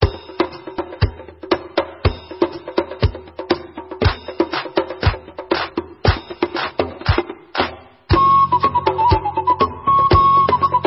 Debka, Instrumental; Debka Dor

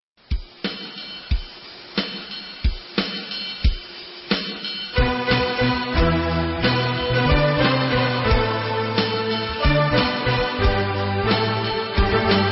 Vocal

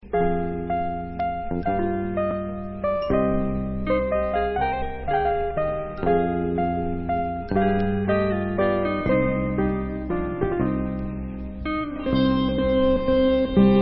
Instrumental

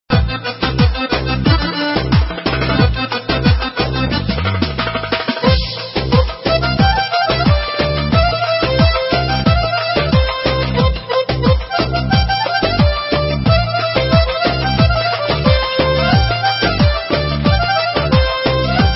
Damat Halay, Turkish

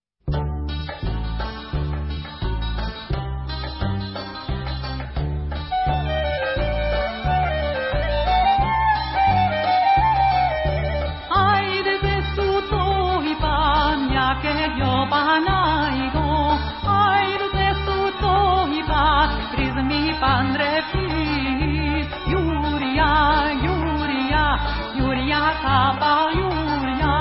Zvarniara, Greek

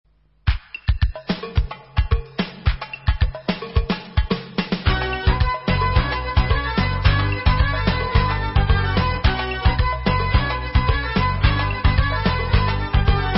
Debka, Instrumental; Debka Dikla

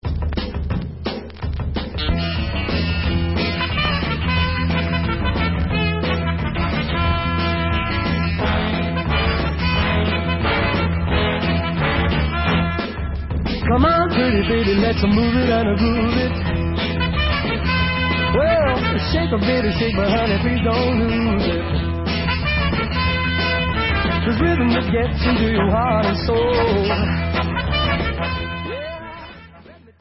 Swing

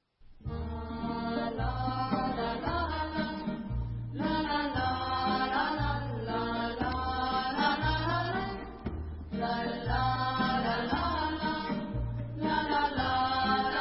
Instrumental

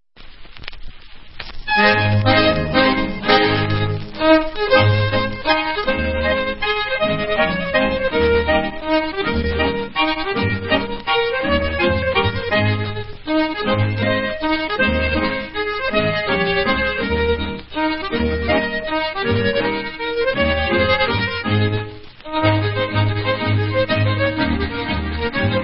Instrumental

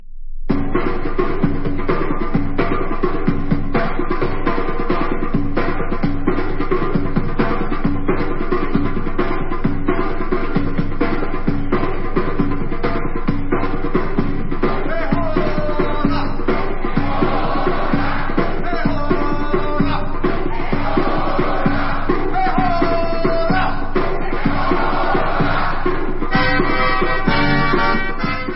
hora, folk instrumental

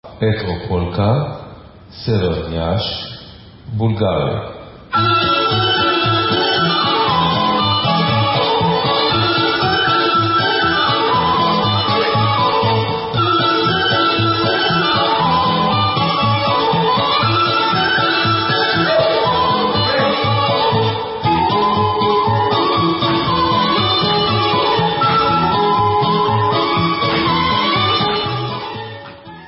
Bulgarian Instrumental; Etropolka

